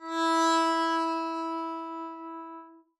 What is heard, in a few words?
arco e4 mf down, double bass